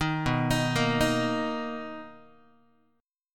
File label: B Major 7th B down Guitar; arpeggiated; JGuitar